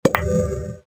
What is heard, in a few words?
Powerup Pain Killers Trigger